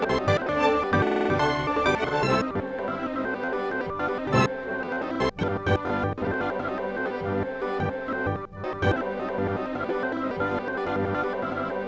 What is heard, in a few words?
classical recompose